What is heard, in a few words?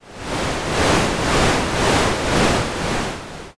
murmur